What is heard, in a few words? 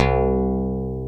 C-1 pick, pick bass; AKAI S-Series CD-ROM Sound Library VOL-7